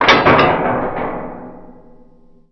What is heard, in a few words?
door metal shake, doors; svencoop